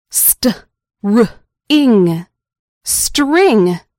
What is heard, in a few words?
string